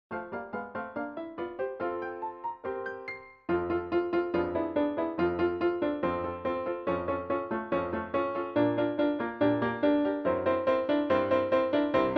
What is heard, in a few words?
Scherzo Horn